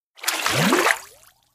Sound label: koi animation disappears Fail Fishes; koi princess